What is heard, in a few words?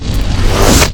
lightsabers deactivate cross; star wars; adv lightsabers